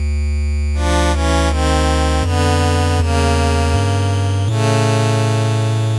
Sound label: gameover; panicpumpkin